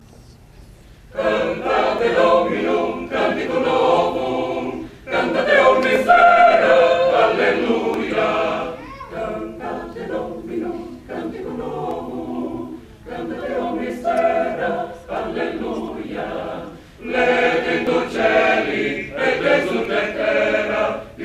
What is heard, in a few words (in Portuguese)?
coro; coro alpha; cantate dominum